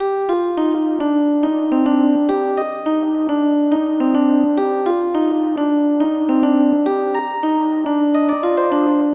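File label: 105, C minor, Synth Lead; Introvert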